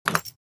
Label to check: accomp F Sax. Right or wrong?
Wrong